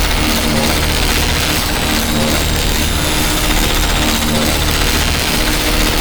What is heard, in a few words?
Shocking Iron Loop; Vehicles; Abilities; Warframe; Nechro Mech